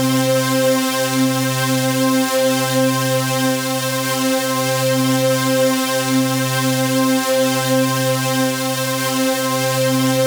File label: Bright SAW